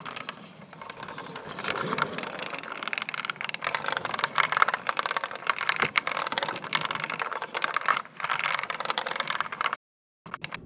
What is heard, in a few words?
Resynth Wood Roll